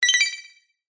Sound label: multimedia game sound digital high pitched generic tone